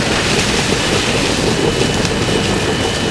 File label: Mod Armour Loop Forest; Civ4 beyond sword